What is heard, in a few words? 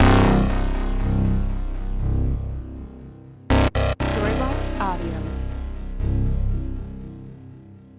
Ambient House Bass 120BPM C